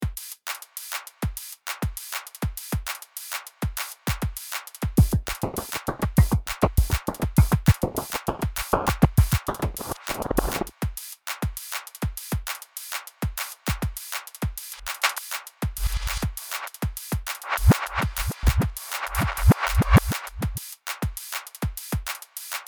hologram electronics microcosm midisync drumloop blocks